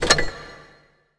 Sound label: enter; World of Tanks Blitz